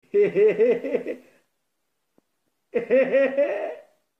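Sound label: male laughter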